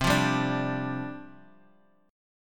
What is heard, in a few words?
C Suspended 2nd Suspended 4th, down, Guitar, Standard; Csus2sus4 strummed; JGuitar